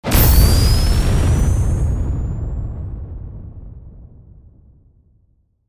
Engine Ignition Burst Engine Start Nitro Flare Blast Explosion